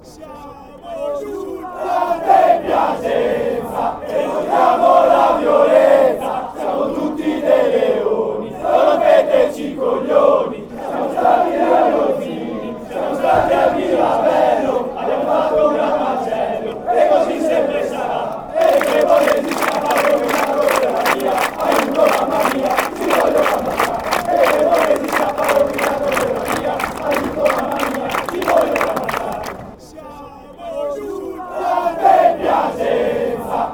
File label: fanchants